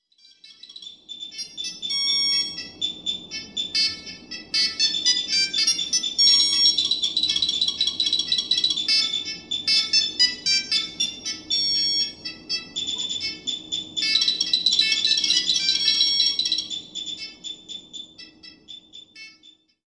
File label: dial tones; telesymphony